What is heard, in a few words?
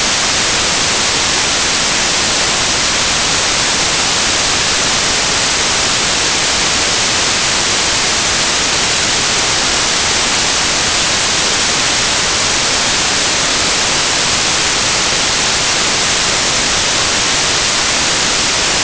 white noise